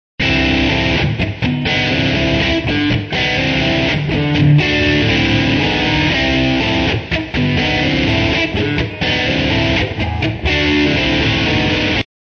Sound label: d bluesy; a bluesy .wav in D